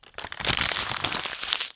froissement

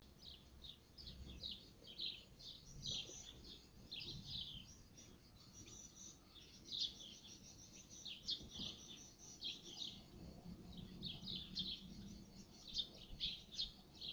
exterieur

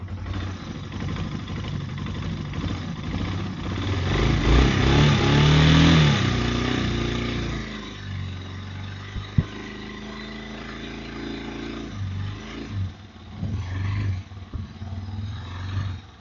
moto

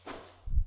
souffle

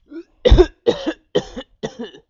toux

